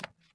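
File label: marble run right, footsteps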